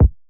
trap kick

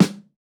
snare samples, Rock

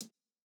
Jrock hihat

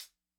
hihat, Acoustic

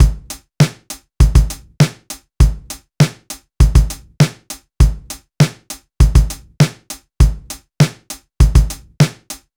Rock loop; Reference Beat

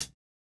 hihat, house

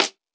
hiphop snare, Effect on

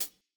hihat, lofi hiphop; Legendary lofi hiphop

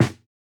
snare, snare house

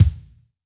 Hiphop kick; Reverb+EQ+Comp+Limit

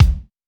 kick; Reference Beat BPM-130; Pophits

